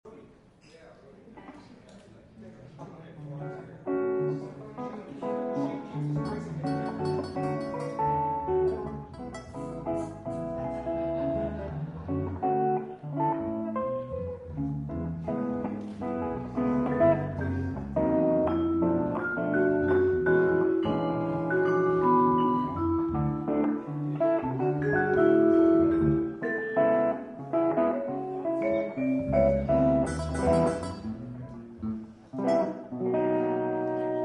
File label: ambient jazz background